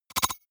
noise metal scratch splutter; soundpool

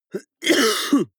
cough male single attenuated; koerpergeraeusche

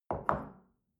shell place on table soft, gastronomie; soundpool

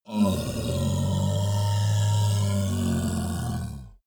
monster dark growl bubbling; kreaturen

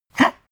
pug gag short; natur-tiere; hunde

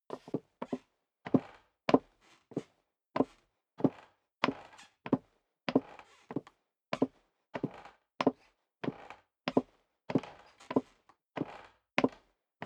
step leather shoes wood creak downstairs sequence

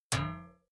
can hit damped crunchy; whooshes rises; hits impacts

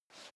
step dress boots metal dry slide short; schritte; Footsteps; menschen

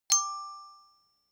notification glockenspiel fifth

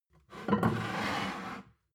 plate stack slide table, gastronomie; soundpool

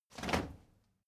step crowd march quiet stop; schritte

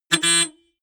notification honk, autos

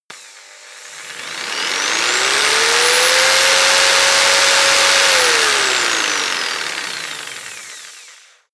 jigsaw engine medium fast long; maschinen; saege; soundpool

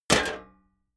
sheet clank damped

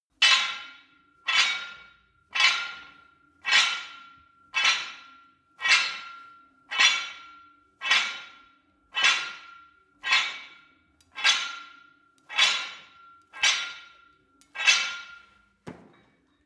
barbell weight rattle sequence, fitnessstudio

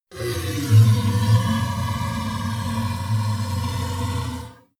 monster inhale low; kreaturen

